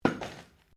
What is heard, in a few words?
METAL ON GRAVEL IMPACT GENTLE; Metal (Sound Effects:Materials)